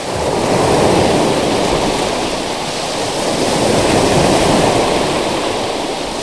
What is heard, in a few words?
sea loop; ambient; AssaultCube; Alien Stars; American Muscle Series